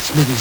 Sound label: LSDj, Nintendo Gameboy; 300 Drum Machines